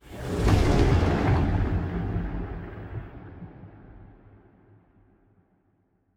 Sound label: ship cruiser move; Stellaris; machineage